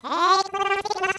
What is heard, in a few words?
ufo